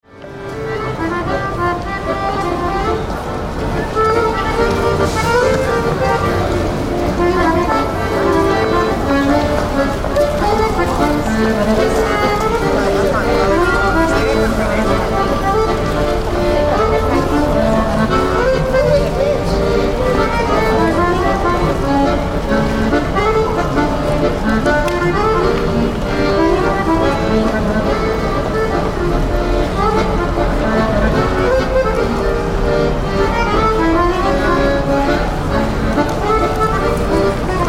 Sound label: Street Accordian, Accordion player busking in a busy city street; Concerts; Backgrounds